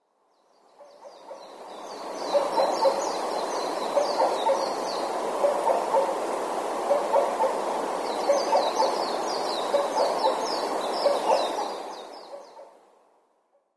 tsutsudori, sakata

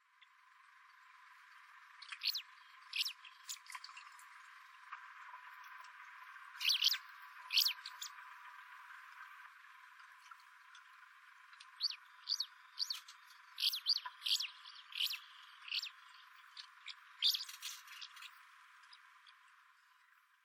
kanmuriumisuzu, tokushima, koe

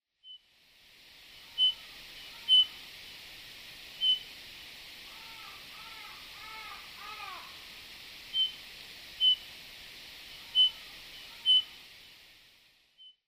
uso, sado

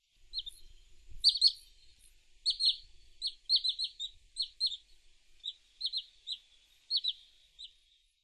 takabu, tatsuno

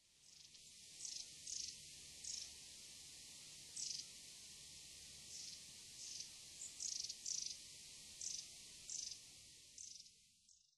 shimaenaga; sapporo

